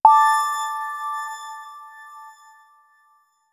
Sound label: item pickup sound, collectibles